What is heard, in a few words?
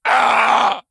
pain zombie; cstrike